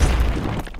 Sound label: normal impact; Minecraft; Votive 1.21.1; Votive Content